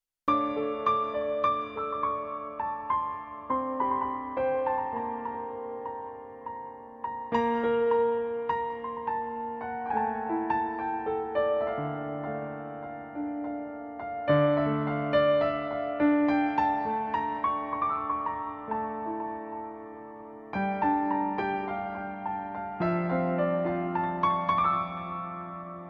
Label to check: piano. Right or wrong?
Right